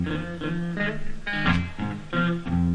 087 oldie blues guitar loop; SAMPLED MUSIC LOOPS

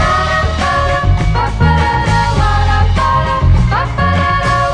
quarteto groove; SAMPLED MUSIC LOOPS

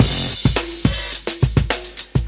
earlyhorn, 105 bpm, drum loop; 101 to 110 bpm

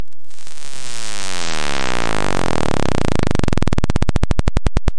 cutoff square decrecendo

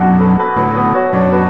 160 piano mood crisis no drums; SAMPLED MUSIC LOOPS

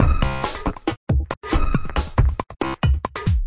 138 glitcher, drum loops and breaks; 131 to 140 bpm; samples-ghost

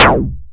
fm clonk

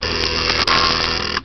Disk Drive

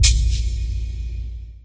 deep ambient hit; Weird and Interesting Hits; single hits

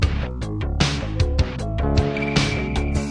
076 bass, sampled music loop; MELODIC LOOPS

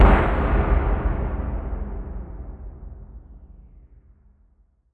drumsplosion boom

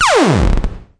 smatter zap

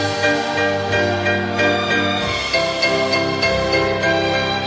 102 epic string piano; SAMPLED MUSIC LOOPS

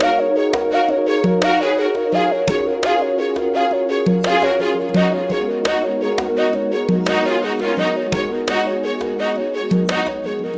090 whistling for tomorrow, sampled music loop; SAMPLED MUSIC LOOPS

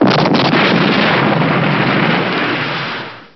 Cannon

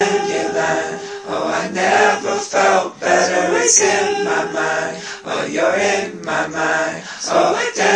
dandelion vocal harmony, Folksy

